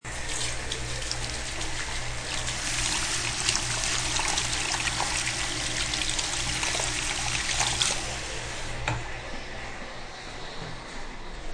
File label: Washing hands in faucet.